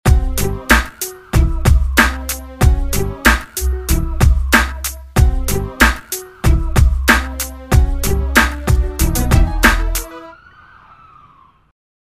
bass 94bpm

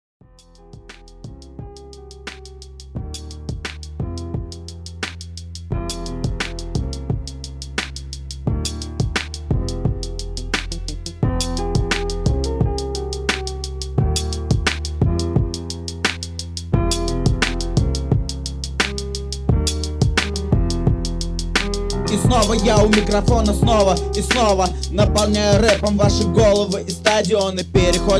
rap is life

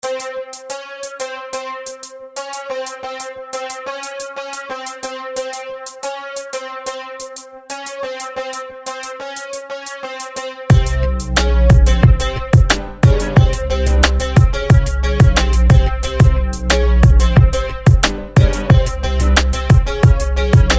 lowbass low

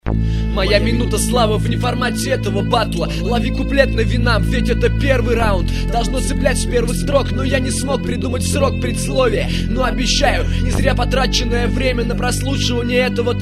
rap live battle

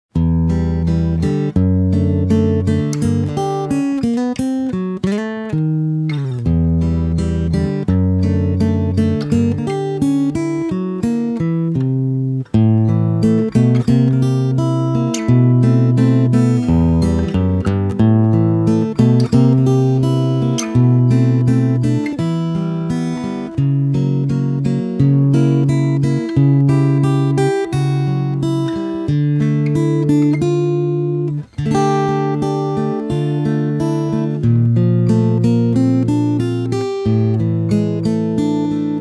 instrumental

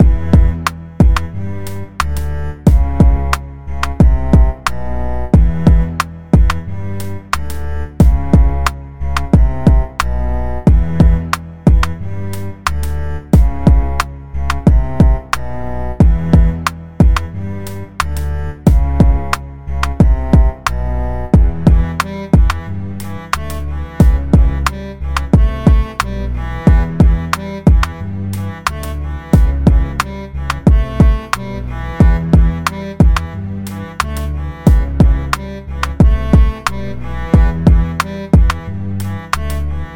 gunshot 96bpm

